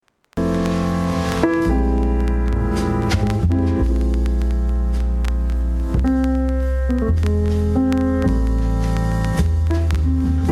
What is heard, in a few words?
Instrumental